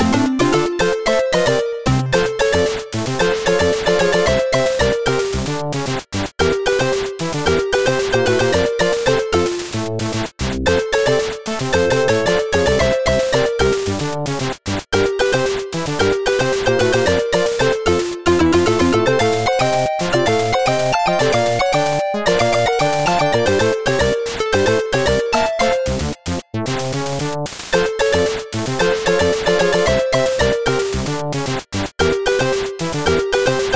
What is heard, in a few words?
Chiptune 8 bit Level 1; Adventure Island